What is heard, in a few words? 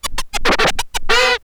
TURNTABLES, Hip Hop; InVision Interactive; 40 Oz Phat Slammin